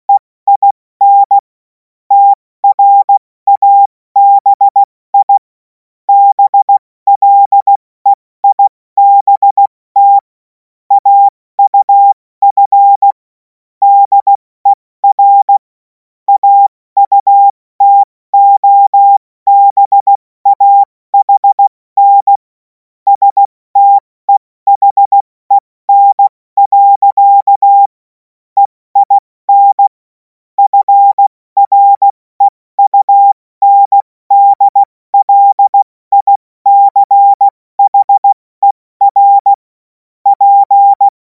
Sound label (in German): Witz, Morsekurs, cw morsen; Tempo 70 F80; hamradio; cw witze